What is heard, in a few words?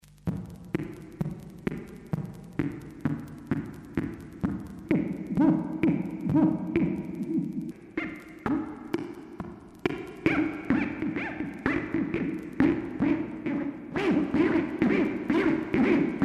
Joystick session